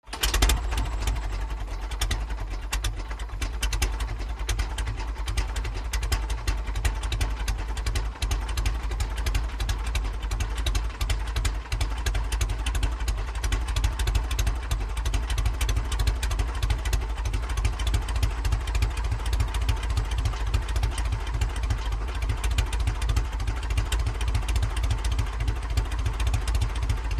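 Idle With Rotating Props, Rhythmic Puffing And Popping. Revs Up At End With Sharp, Buzzing Engine Sound And Loud Clicks. Close Perspective. Prop Plane.; Taylorcraft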